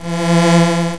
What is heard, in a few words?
Laser Fire; Io